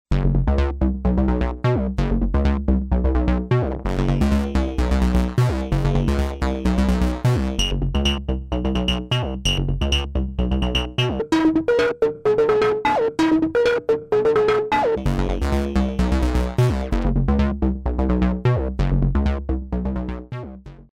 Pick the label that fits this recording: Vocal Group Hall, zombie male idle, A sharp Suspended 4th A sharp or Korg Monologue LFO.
Korg Monologue LFO